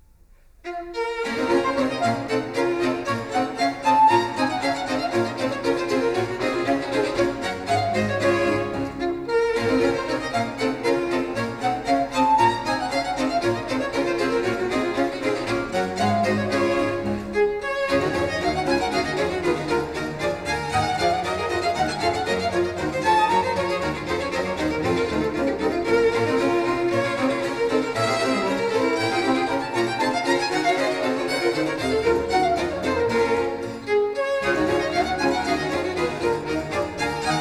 Orchestral Suite in B minor; Orchestral Suite No. 2; BWV 1067; J S Bach; 4 Orchestral Suites

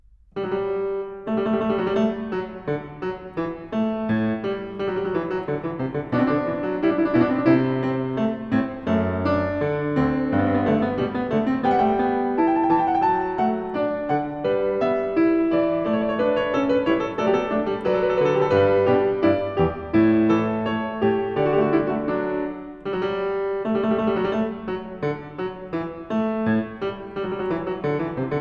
Variation, Fughetta; Bach; Goldberg Variations, BWV 988

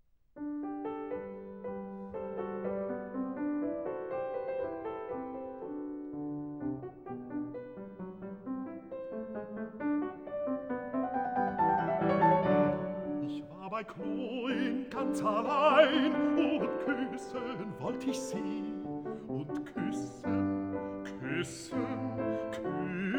arietta for voice & piano; Der Kuss (The Kiss); Beethoven; Lieder

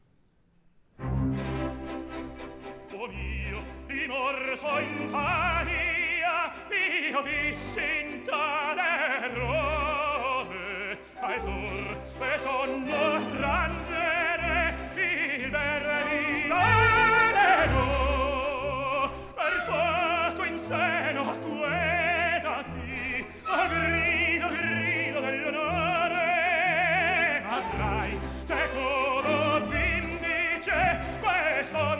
opera; Act 2. Oh mio rimorso!; Verdi; La Traviata; Violetta

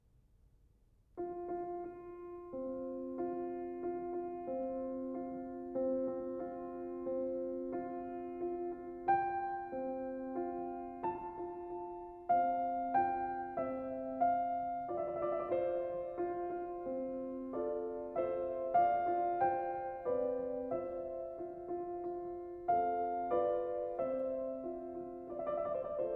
Nocturnes for piano; Nocturnes (8); No. 3 (Les Cloches de Malines); Poulenc

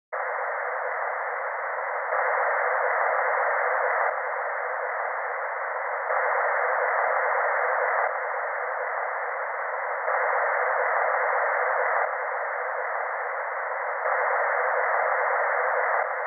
Surround-Sound center speaker phase; Surround Sound Setup and Imaging; Surround Spectacular

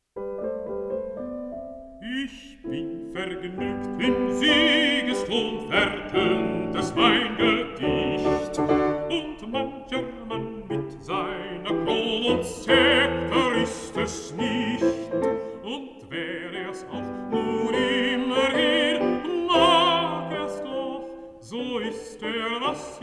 song for voice & piano

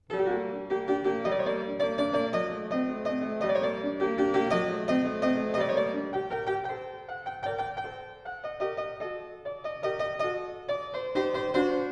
Sonata for violin & piano in G major, Allegro; Mozart

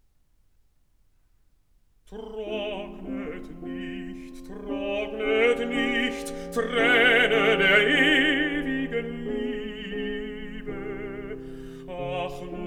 song for voice & piano

